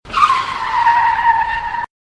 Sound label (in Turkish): araba sesi